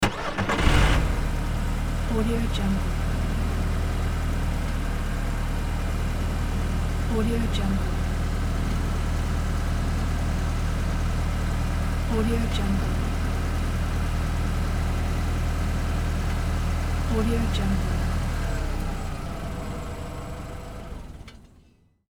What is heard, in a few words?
car engine